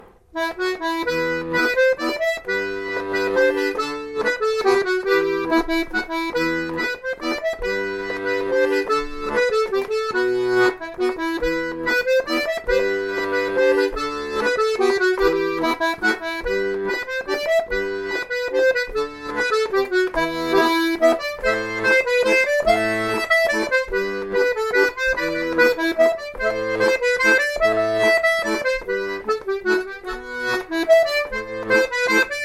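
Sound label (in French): mazurka, accordéon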